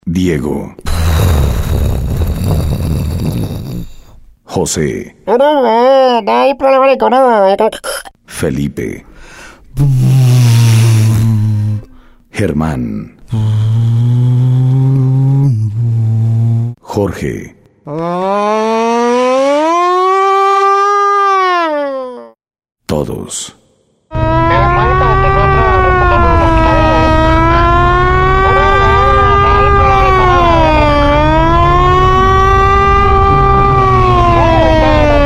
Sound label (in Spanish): Ambulancia